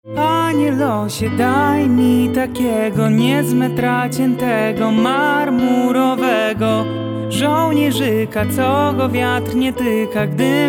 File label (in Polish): KOLYSANKA ZOLNIERZYKA